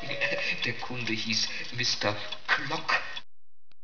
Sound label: clock